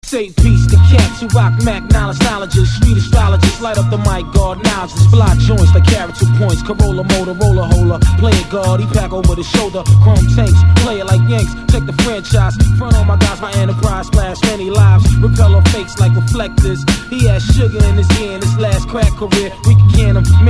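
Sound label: hip hop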